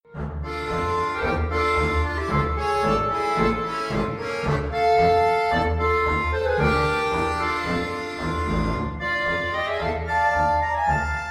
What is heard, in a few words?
Tango británico Trio Computer Sample